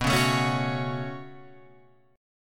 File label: B 7th Suspended 2nd down Guitar Open F, chord; B7sus2; strummed; JGuitar